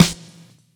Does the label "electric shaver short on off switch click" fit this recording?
No